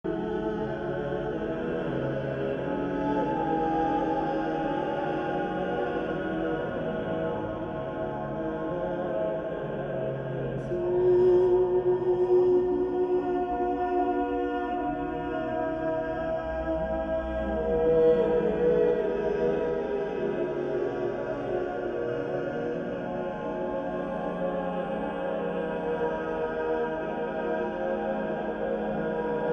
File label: classica